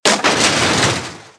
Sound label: metal fence; World of Tanks Blitz